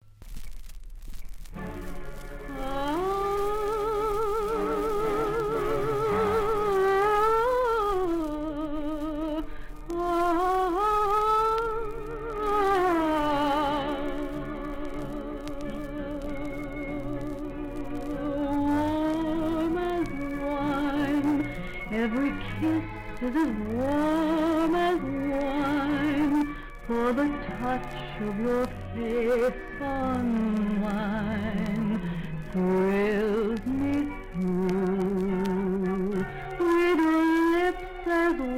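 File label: Female Vocal with Orchestra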